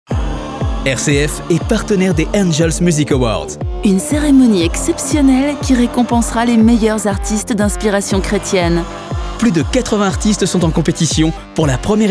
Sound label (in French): Jingle